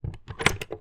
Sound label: lock open